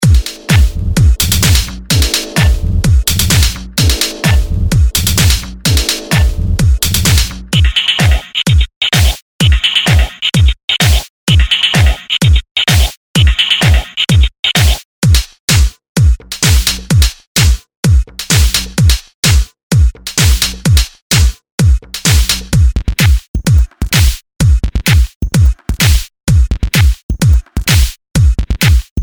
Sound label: Tech House Top Loops